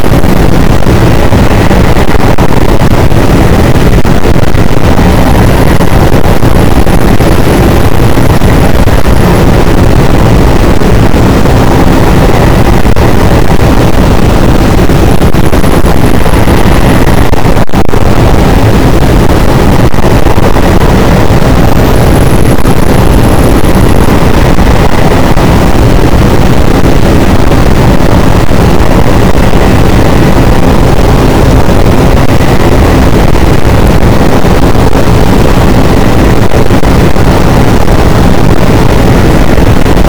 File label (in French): cachalot, boat; Physeter Macrocephalus